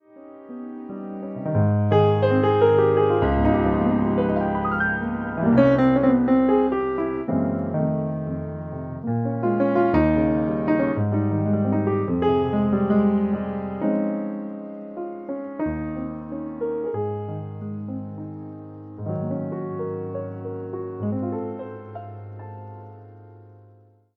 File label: piano